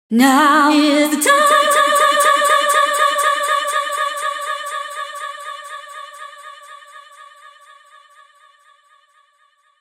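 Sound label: pure analog deep house loop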